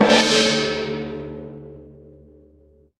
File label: instrument gong